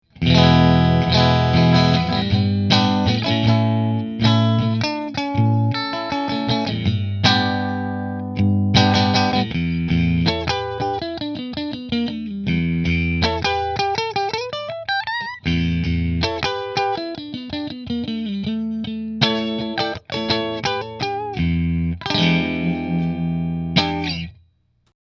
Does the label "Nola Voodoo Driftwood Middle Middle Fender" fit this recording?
Yes